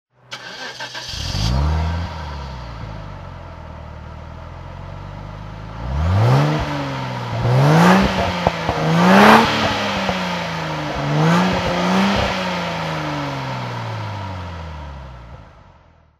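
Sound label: aston martin db7 auto throttle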